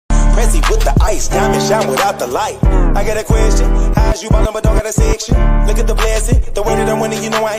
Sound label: octaver